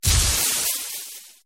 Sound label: gem chain